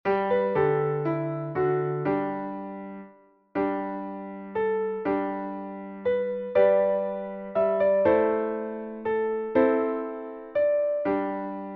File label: away in a manger